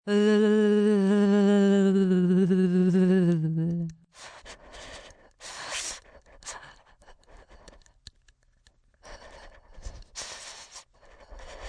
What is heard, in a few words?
Chattering Voice, Teeth Chattering, cold, freezing, shivering (human voice); Talking